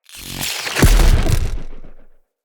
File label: Voltaic Elite Weapon Electricity Cast; Weapons; Enhancements; Elemental